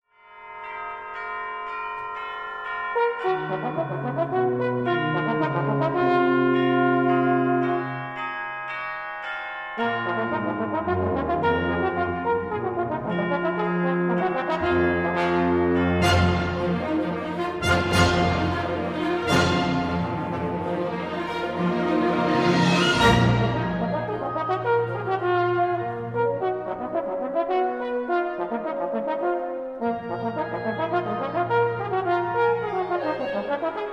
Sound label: trombone con mvt. iii